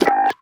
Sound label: mainmenu deny; gmod; mw; user interface